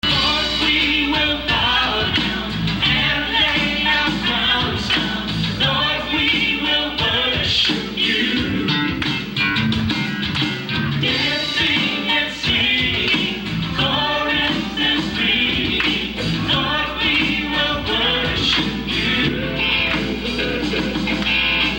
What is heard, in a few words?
Bass Guitar Bridge; basse; Musique; premiers pas à la basse en public